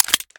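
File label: pistol reload empty fast mag out; gmod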